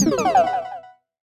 Warp Porter End; SM3DL

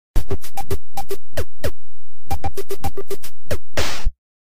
Game Start

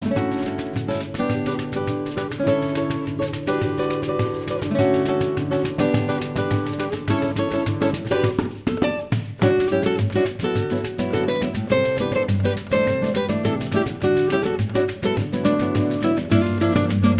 Acoustic Arrangement; Daisy Circuit

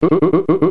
Laugh; Donkey Kong Country

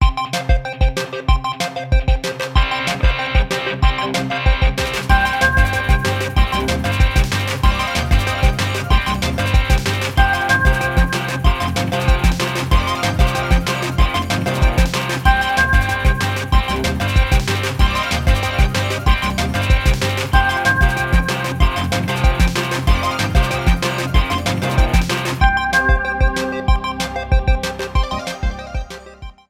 Pump it up 189 BPM

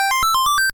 The 1-up sound; Alleyway

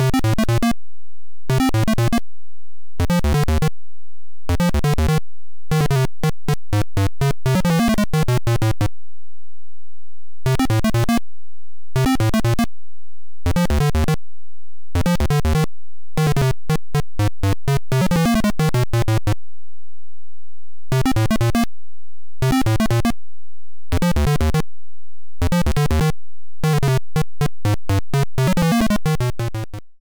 Underground BGM Sharp X1; SMBS

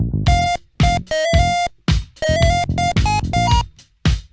Jingle

